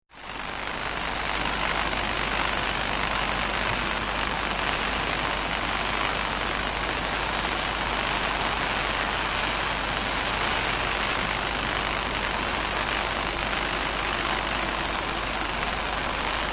Electricity Pylon, Crackling sound of electricity pylon in a rural setting; Electrical